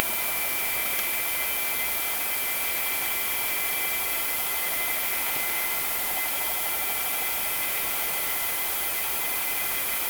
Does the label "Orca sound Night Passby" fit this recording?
Yes